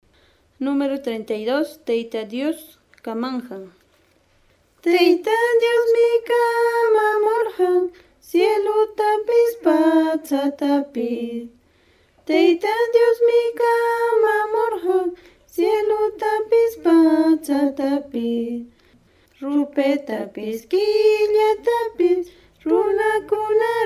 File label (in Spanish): cancion